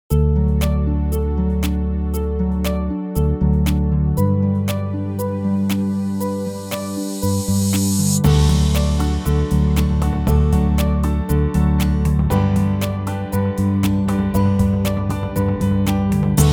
Modern Corporate Business Presentation Instrumental Music